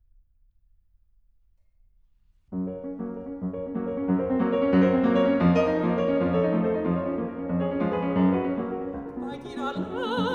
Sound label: refrain song for voice & piano; Bei dir allein; Schubert